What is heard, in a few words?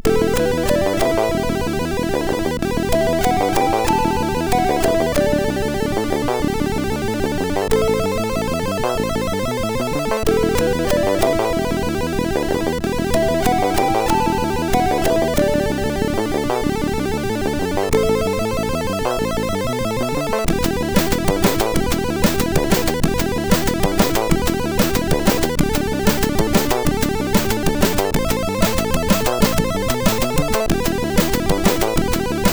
Jingle, sid MOS6581R4